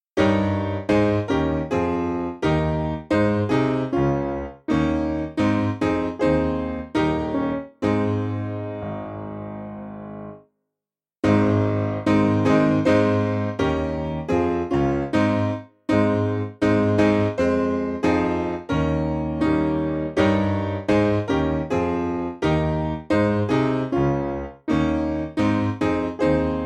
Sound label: Piano